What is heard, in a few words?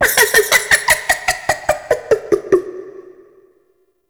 Boss Vocal Laugh; Enemies; Vocals; Warframe; Oraxia